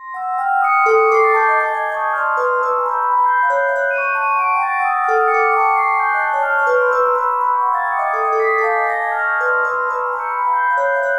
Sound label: WHOLE TONE, GLASS LOOPS; Zero-G Ambient 1